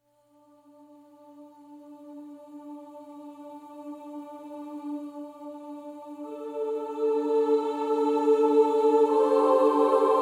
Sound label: choir only